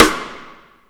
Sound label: long tail reverb steel snare drum d sharp key